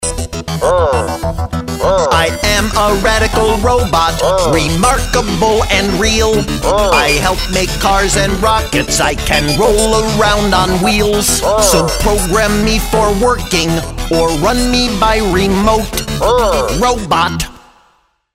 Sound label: R Song Robot; kindergarten grade 1 2 letter sound